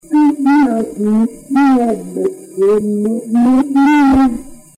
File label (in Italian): applausi